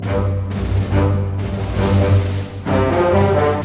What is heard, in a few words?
BOLE, ORC Bolero; East West - Scoring Tools